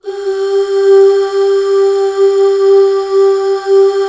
UUHH G4 R, Hallelujah, BACKGROUND; Best Service

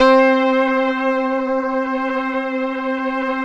SYN, Analog; Sound & Vision; Gigapack I CD 2

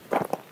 Stony Step, outdoor, Footsteps

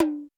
Roland U220 Dry Set

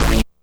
Synth Hit A

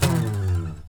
SLIDE, guitar; Spectrasonics; Hans Zimmer Guitars VOL-1; TAKA FX

